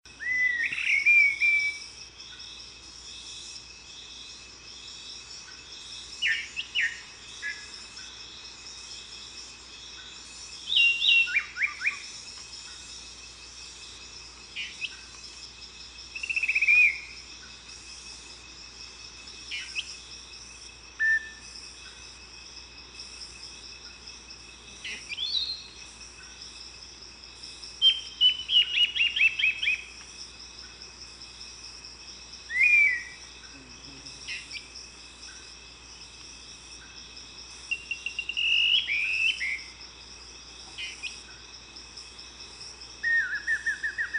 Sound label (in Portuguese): canto do sabia poliglota